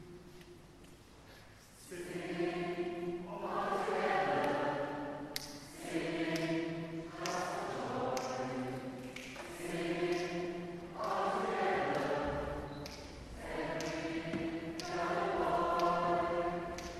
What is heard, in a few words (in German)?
Chor und Jubilaeumschor Warming Up